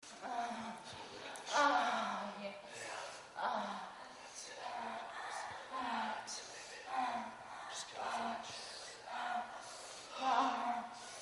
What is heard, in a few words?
moan, moans; The Witcher Hunt